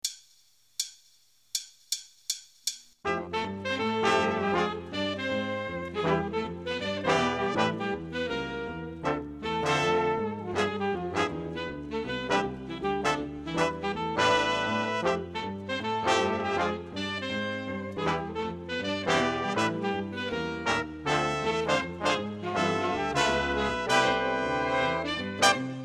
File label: piano drums